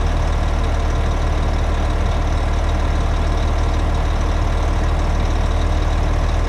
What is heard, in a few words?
500 Rpm Volvo